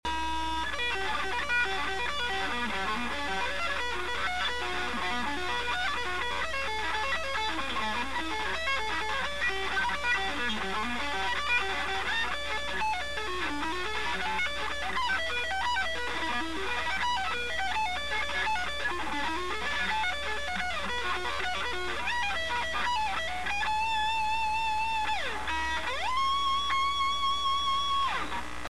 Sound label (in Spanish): ejercicio sweeping